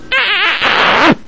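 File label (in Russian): поцелуй резкий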